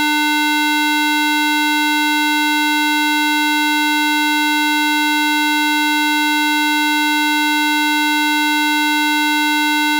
round hp 2

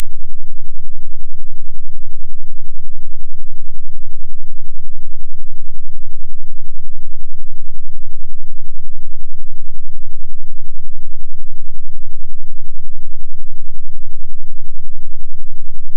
alias

